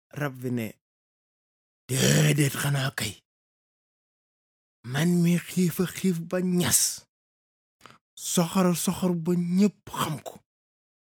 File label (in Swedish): bip; wolof